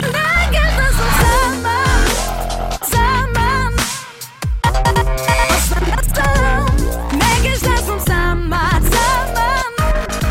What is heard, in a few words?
Macedonian Dubstep